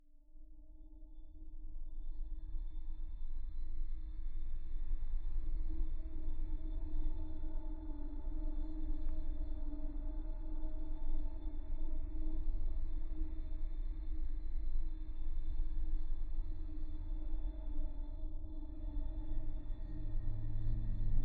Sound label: Horror